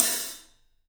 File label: ZIH13, 3D Drums, LITE ZILDJ H; 3D Sound